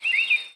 bird random

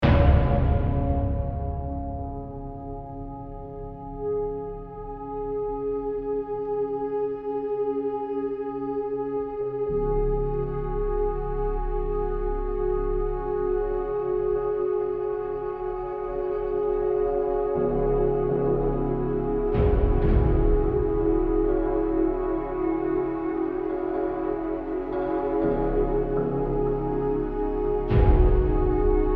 Tension Combat

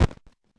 COMPUTER DIGITAL; Five Nights at Freddy's The First Generation

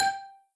Combo Sound

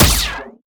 Sciweap Shot Small Laser Punchy Needler

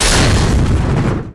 Explosions Blast-Tank

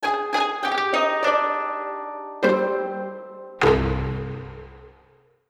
Jingle Rescue Failed; Shiren the Wanderer The Mystery Dungeon of Serpentcoil Island Switch gamerip 2024

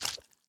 Attack Bug Spit; We Are the Dwarves

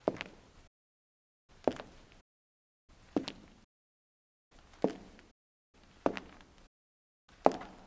Footstep Woman

